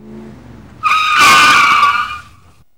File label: slamming, Car Skids; E-MU Producer Series Vol. 3 – Hollywood Sound Effects; Transportation